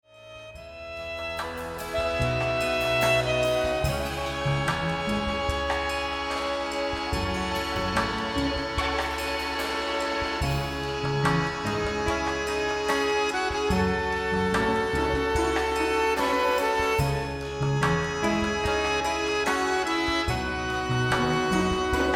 Accordeon

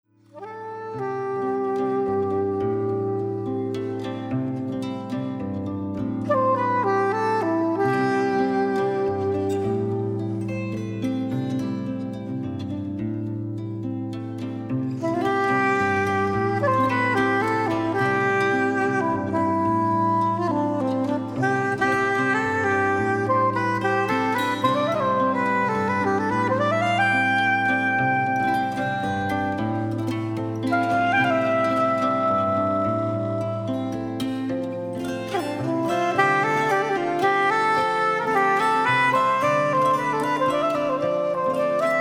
Relax chill

